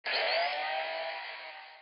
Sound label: vacuum